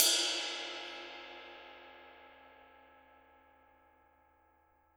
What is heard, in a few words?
RIDE, Drums Acoustic; USB Soundscan vol.10; AKAI; 90s sample CD